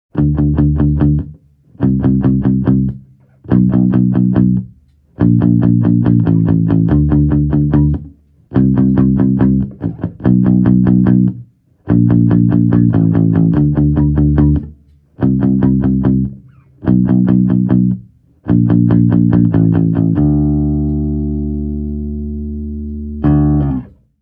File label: P-Man plectrum, bass